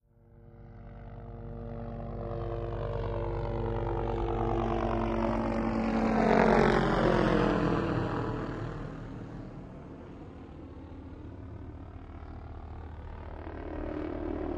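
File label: Loach In Land Medium, little bird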